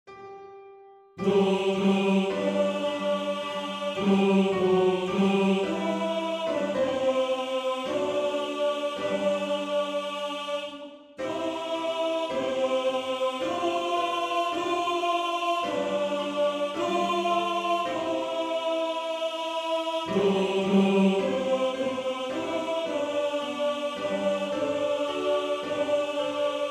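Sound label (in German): Tenor, Männerchor; Tenor 1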